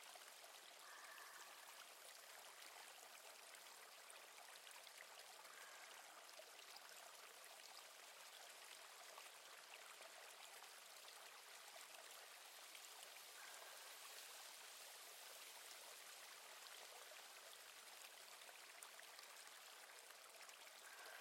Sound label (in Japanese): 寝息ループ